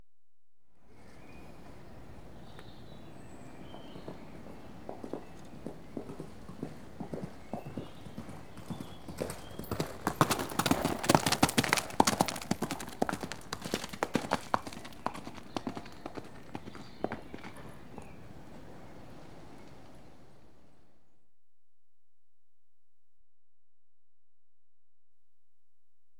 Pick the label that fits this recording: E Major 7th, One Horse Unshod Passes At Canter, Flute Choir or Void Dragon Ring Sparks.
One Horse Unshod Passes At Canter